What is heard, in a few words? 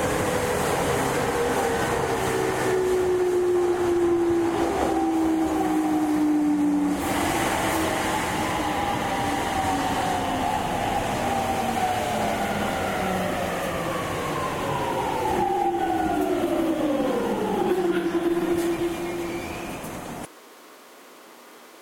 E231 sub middle stop; tetsuon